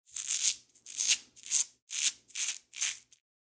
Vegetable peeling with peeler; cooking

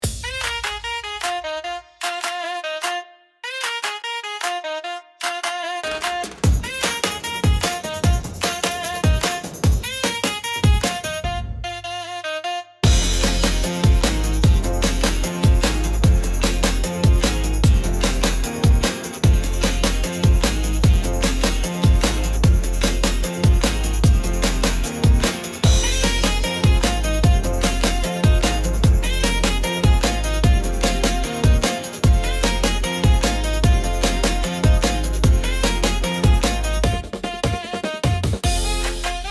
Uplifting pop beat

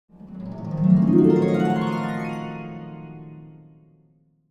Cartoon dream

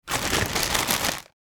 Shopping bag movements rustling

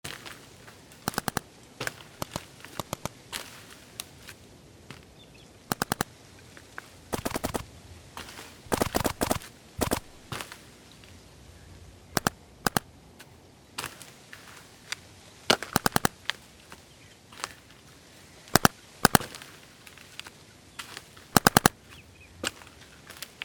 Paintball field ambience paintball guns

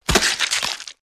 Zombie death

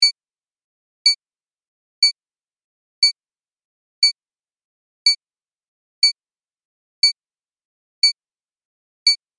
Countdown timer 10 seconds beeps

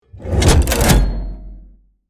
Machine lever pull

